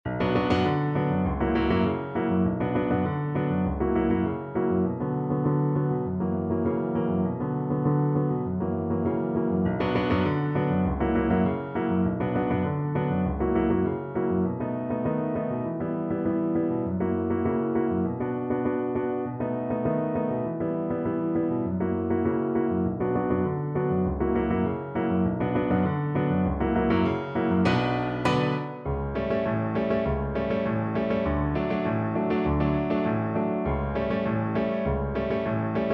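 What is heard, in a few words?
viola karaoke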